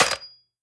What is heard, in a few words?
give orders invalid; Hearts of Iron IV Death or Dishonor